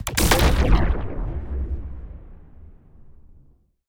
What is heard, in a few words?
Misc Sci-Fi Explosion